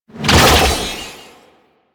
Archwing Prime Sword Whoosh; Warframe; Weapons

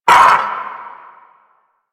Vocal Pain; Warframe; Duviri; Dax; Vocal Set A

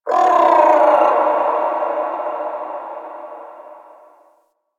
Landscape Distant Creatures, Ambience; Zone Two; Warframe; Venus Open World; One Offs

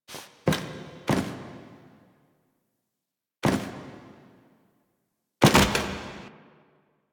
Steps; Teshin; Warframe; The War Within

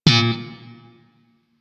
Shawzin Slap Oct Two A Sharp, Chords; Music; Warframe; Sentient Shawzin

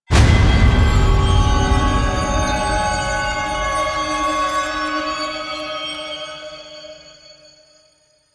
Chimes; Ballas; Warframe; The New War; Throne Room

